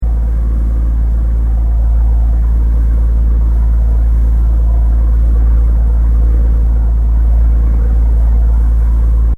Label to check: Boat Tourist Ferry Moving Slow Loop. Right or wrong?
Right